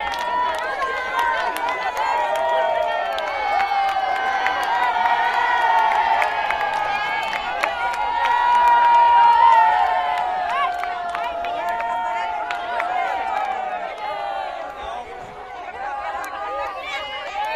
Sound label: Spanish Crowd, spanish